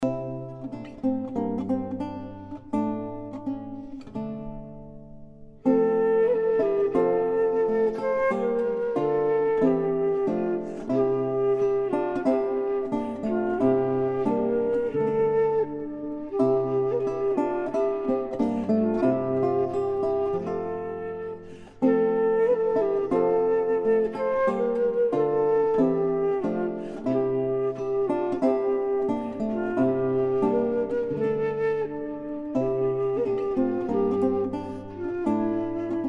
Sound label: instrumental; mignonne allons voir si la rose